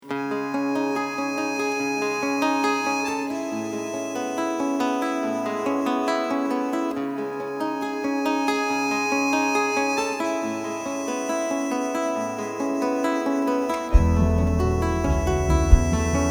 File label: TomTom Groove